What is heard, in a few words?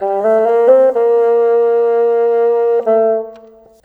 Bassoon, Orchestral, Rock Pop